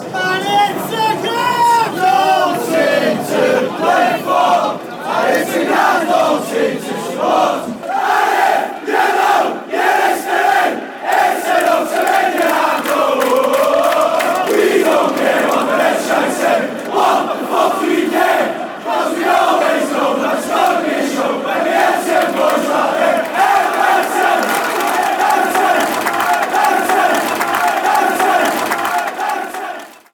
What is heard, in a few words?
its grand old team